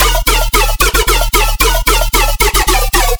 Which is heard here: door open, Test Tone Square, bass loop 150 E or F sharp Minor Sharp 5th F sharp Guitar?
bass loop 150 E